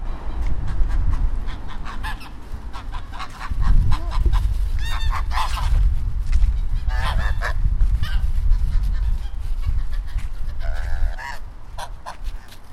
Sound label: chased by geese